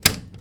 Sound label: Circuit Breaker